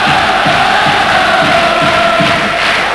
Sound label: Chant, Crowd; David Beckham Soccer; XBOX